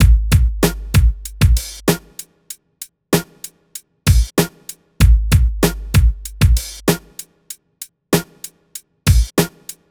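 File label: Drums, Hip Hop Exotica, 096 A minor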